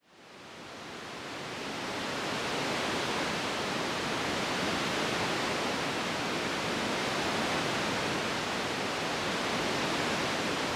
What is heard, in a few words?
forest morning; the sleepy owl soundscape pack